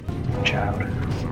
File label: child